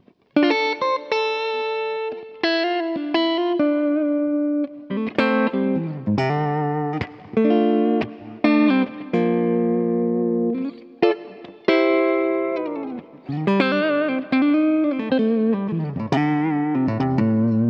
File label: Compressor; Walrus Audio Mira; Pocket Player